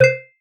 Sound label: upgrade select; hella farm